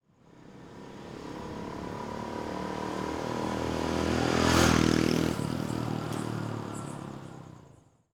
Motorcycle four Stroke Engine tvs Victor indian pass By At Fast Speed; The AudioVille; Motorcycles of India